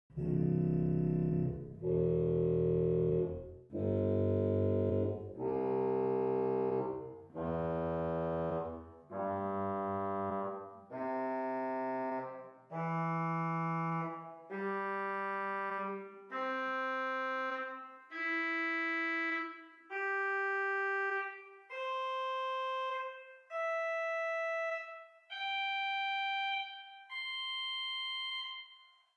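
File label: Swell Contra Fagotto 16 arpeggio, organ; Hauptwerk; St Annes Moseley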